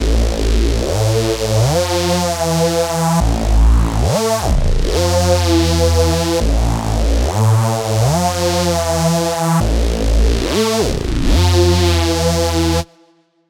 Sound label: Angry Reese, bass synth; Wave Alchemy - Bassynth 1.1.0